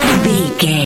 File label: retro damage hurt ouch